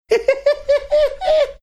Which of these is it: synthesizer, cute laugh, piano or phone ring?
cute laugh